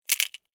Insect Claw Snap, Movement, Bug; Animal

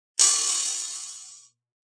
Metal Fizzle; Metallic; Electronic

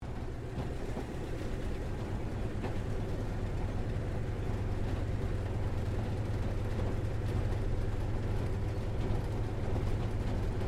AIRCRAFT CA-28 CERES TAXI INTERIOR; Airplanes; Vehicles

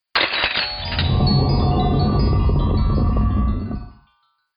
Magic Stone Door Revealed, video game

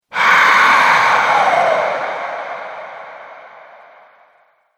Ghost Breath, scary breathing

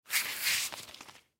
Ski Goggles Take Off; Skiing and Snowboarding; Blastwave

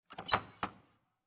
Household, Thermostat, Open Plastic Cover, Lid; Furnaces

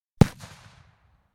Gelignite, three 8oz sticks explode at 20 feet; Explosion Sound Effects; BBC

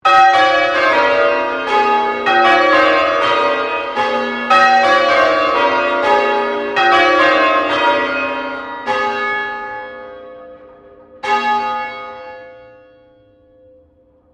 Bell St Pauls Cathedral Melbourne Bells Sequence; The bells of St Pauls in Melbourne

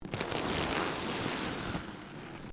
LEATHER MOVEMENT

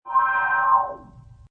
Sf Wipe, Button sound or menu sound; Multimedia

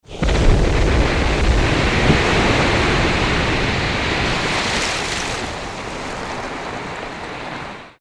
Explosive Wave Crash, Huge heavy wave impact; Ocean; Nature; Tom Hutchings

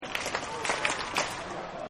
Clapping Unison

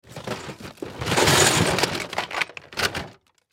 Toy Chest Pull Out Object; Miscellaneous Cartoon; Blastwave; Foley

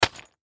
Art Supply Bag Set Down Drop; Set Downs; Foley; Blastwave

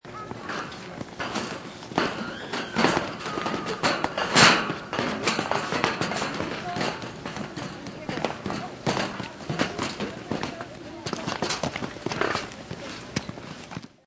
Ski Boots Metal Stair

